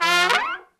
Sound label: SECT BRASS FX